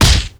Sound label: fight punch; idles; ogremagi; dota